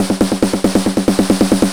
snare roll 138, snare-rolls, Techno; Techno ID; drum-loops

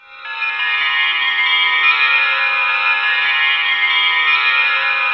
WINDER C3, Synth City

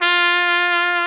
SOLI TRP, Alpine Volksmusik, TROMPETEN; Best Service

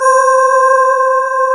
SPACE VOICE, SC55 VOX; East West - Global Sounds

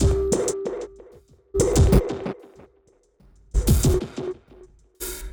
Gated Beat (90BPM); musicradar

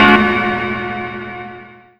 PIANO VERB; Big Beat Samples & More; AKAI; KEYBOARD KIT

